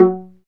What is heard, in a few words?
STR VLA, Chamber Pizz; Roland - String Master Series